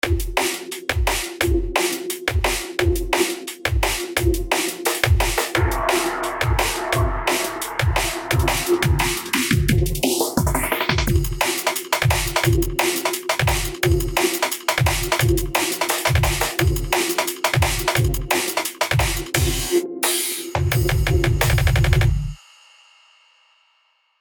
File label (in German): Neurofunk Soundbeispiel